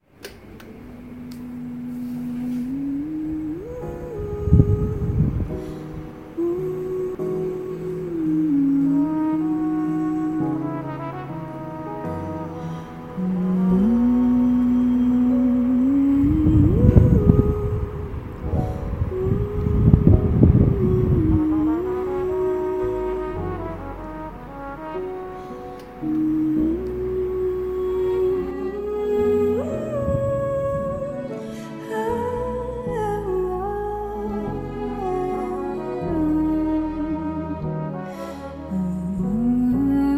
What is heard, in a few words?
building scene